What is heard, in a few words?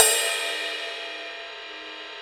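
Suspended Cymbal Bell; Digital Sound Factory Virtuoso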